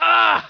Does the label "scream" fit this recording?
Yes